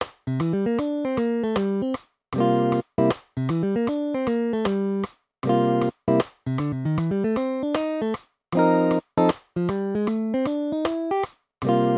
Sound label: duet blues, guitar; Triplet 8th; 4/4